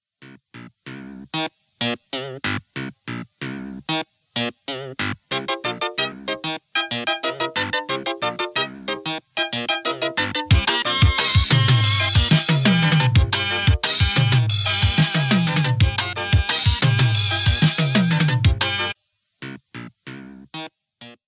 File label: punnagai mannan bgm ringtone